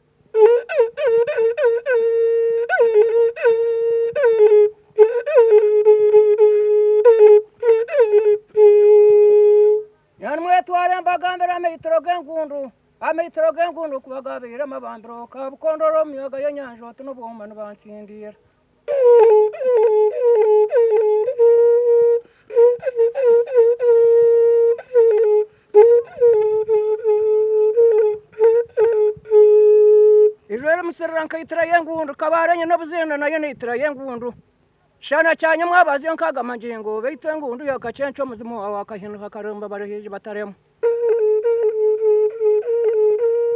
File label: Enzamba, uganda